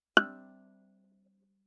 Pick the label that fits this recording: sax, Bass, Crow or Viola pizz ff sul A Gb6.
Viola pizz ff sul A Gb6